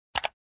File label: button click